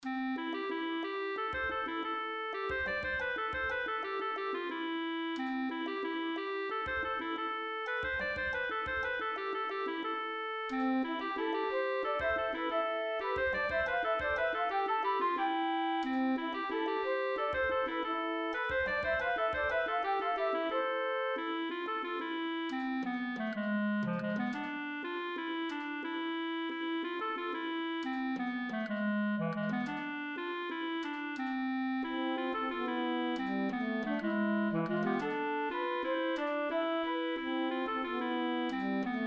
Scottish de l'ellé bord

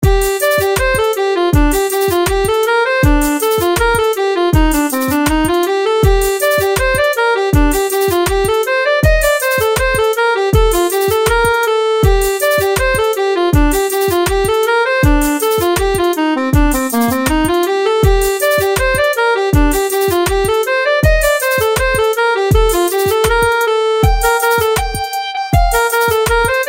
Scottish en Reel Quatre

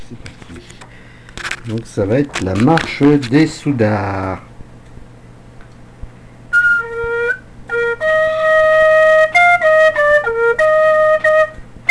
Marche des Soudards

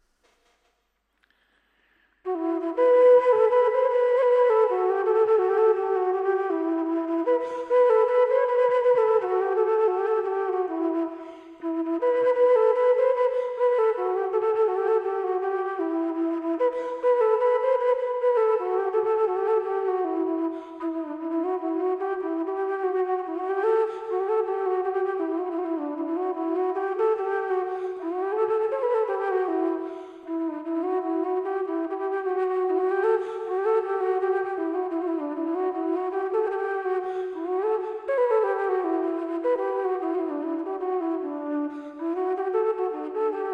Mazurka du pays Plin

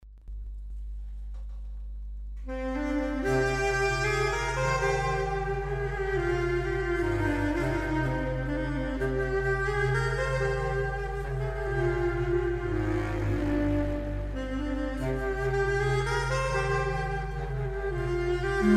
mazurkas; Les deux mazurkas des écoliers de Saint-Genest

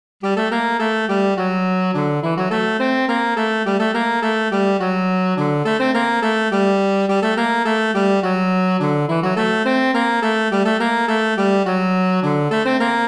Bourrée; Miniscence

